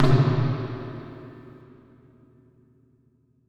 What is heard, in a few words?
FX TOM, Drums Acoustic; USB Soundscan vol.10; AKAI; 90s sample CD